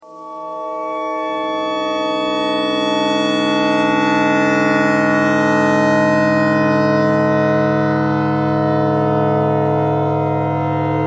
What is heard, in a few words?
clarinet notes e thread noise